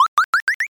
bounce

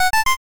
chain

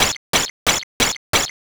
flutter kick

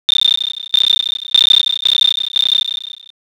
insects

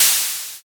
open hat

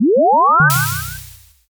warp jump

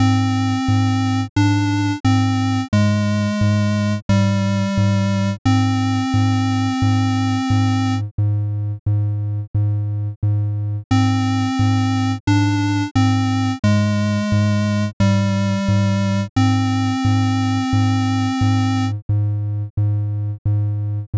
bgm

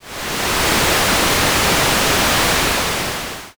waterfall

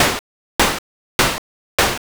snow step

